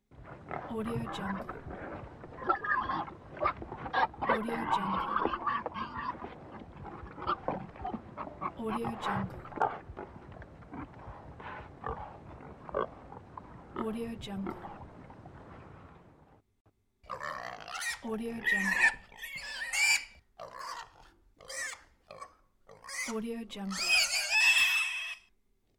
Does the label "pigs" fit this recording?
Yes